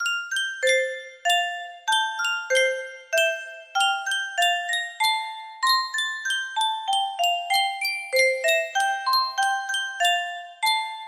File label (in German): Yunsheng Spieluhr, music box melody